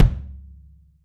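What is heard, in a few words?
wood kick, left; 3D Drums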